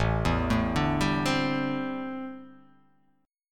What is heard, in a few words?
A Minor 11th A, Chord